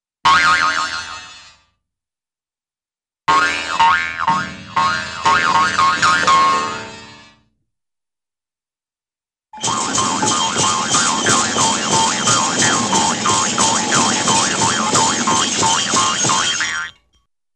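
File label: Cymbal Crash with Boing, Cartoon; Hollywood Edge; Cartoon Trax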